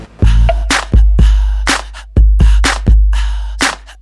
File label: hump intro beat